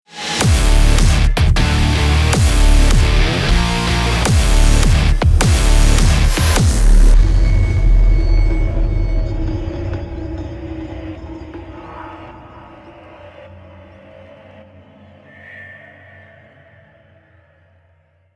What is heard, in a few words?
victory